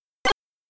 ボーナス加算音; Pac & Pal; Pac-Man Chomp Chomp

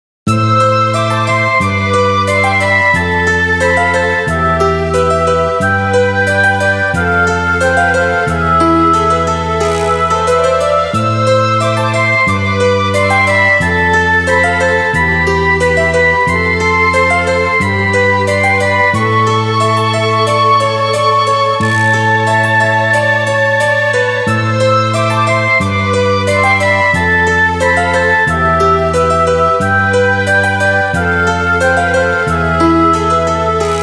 泣き落としのバラード; Momotarou Dentetsu

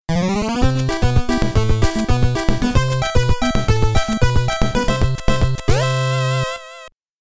レベルアップBGM; エスパードリーム2 新たなる戦い; Konami Antiques Family Computer

